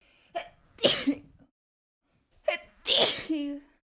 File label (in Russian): zhenskij chihayushij zvuk